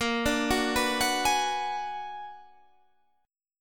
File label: B-Minor Major 13th